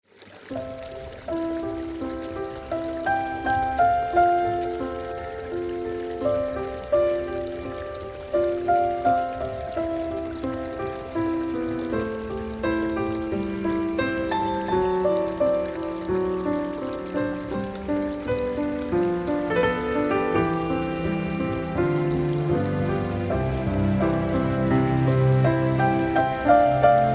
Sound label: Nature Piano